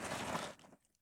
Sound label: prone asphalt; Escape from Tarkov